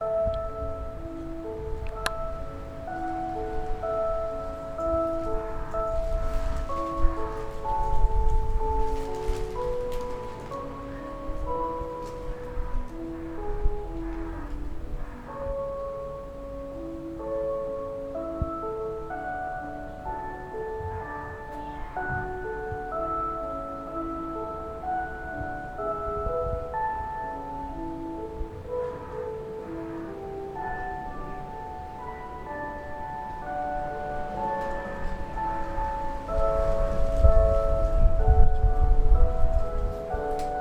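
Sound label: time signal, Kamisuwa, evening; 時のお知らせ（上諏訪・夕）; 茅野市民館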